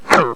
daemon attack; megaglest; megapack; norsemen; battleaxe berzerk

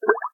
slimenodes dig; minetest

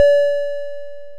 timeout

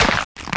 kill; angband

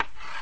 selection, earcons; chromium; chromeos; chromevox

